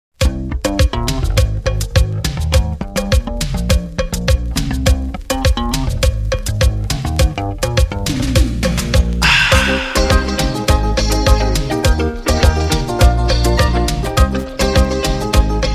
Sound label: Zouk